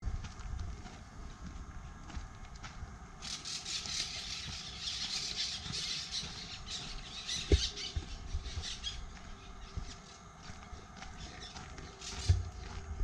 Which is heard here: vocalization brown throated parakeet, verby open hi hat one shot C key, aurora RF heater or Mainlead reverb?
vocalization brown throated parakeet